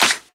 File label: Claps